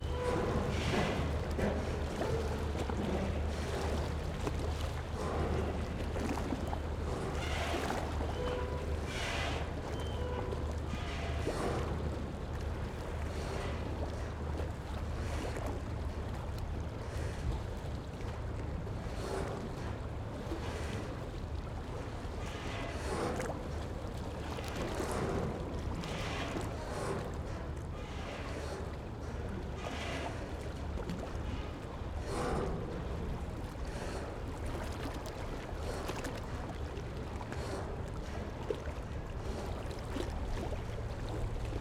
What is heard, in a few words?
City Riverside Industrial Rusty Jetty; Systematic-Sound; General Ambience Series